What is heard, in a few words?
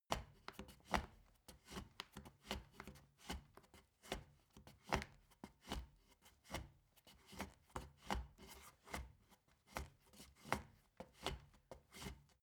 step ladder wood scrape; schritte